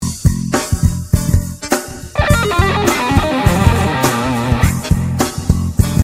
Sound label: Lick